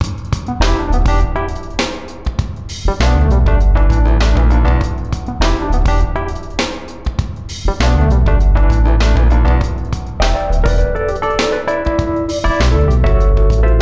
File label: jukebox; vendetta